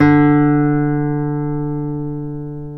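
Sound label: classical guitar, nylon string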